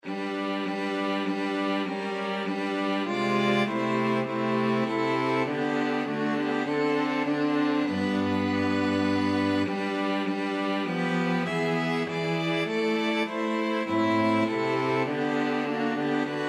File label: STRQ, string quartet